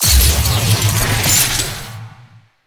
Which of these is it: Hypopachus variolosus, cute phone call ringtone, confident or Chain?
Chain